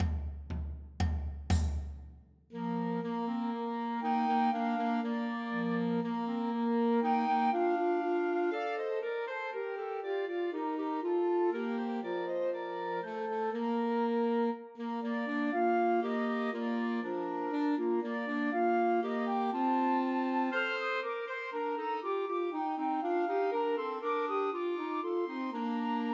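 The Holly and the Ivy Trios for Flute Clarinet and Alto Saxophone; Christmas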